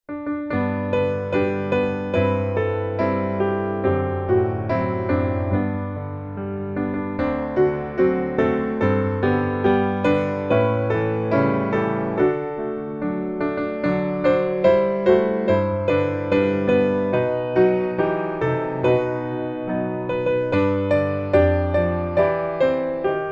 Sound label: piano